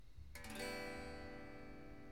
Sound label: guitar